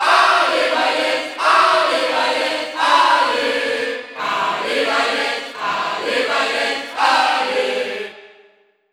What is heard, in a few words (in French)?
Cheer French NTSC; Byleth; SSBU